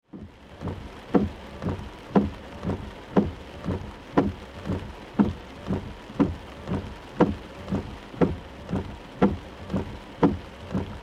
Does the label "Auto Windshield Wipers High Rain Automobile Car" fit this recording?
Yes